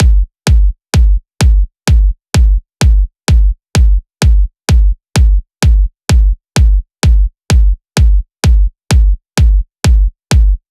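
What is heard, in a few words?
128BPM Bass Drum; Demo Constr Kits; Early Morning Kit Root G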